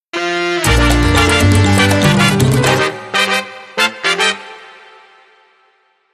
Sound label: Big Band Mambo, Sting